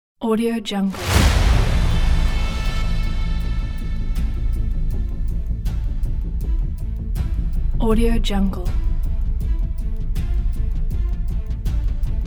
epic hopeful suspense

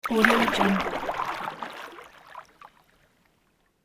single paddle canoe stroke; sound-ideas

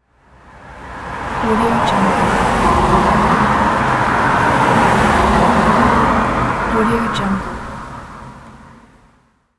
cars pass by

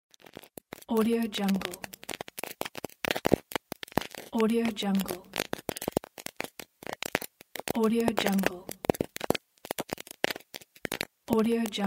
old record crackling

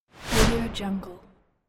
slide; 3dhome

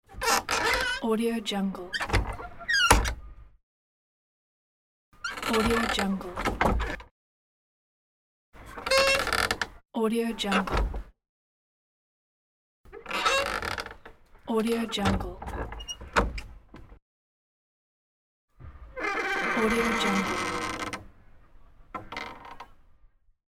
creak of a wooden door

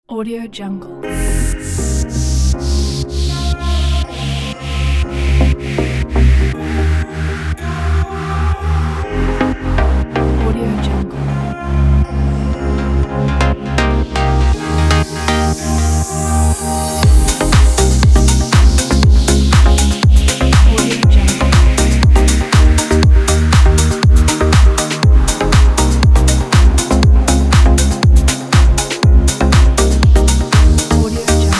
progressive house

